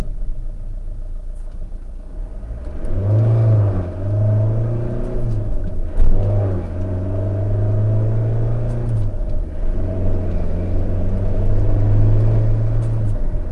renault master 2.8 dti engine acceleration high speed interior stereo; Sonniss GDC